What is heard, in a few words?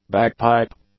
bagpipe